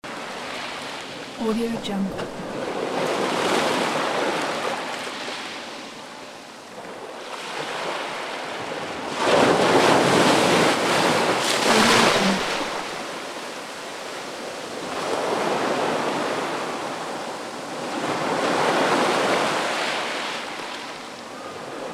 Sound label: rough sea loop